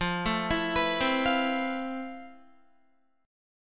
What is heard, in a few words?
F Major Sharp 11th, Guitar; arpeggiated